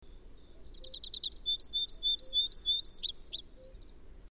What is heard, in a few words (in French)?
pipit des arbres